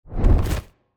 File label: Demon Minion Dash; TANK; 3Souls